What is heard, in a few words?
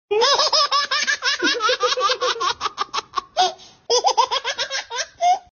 Happy baby funny laugh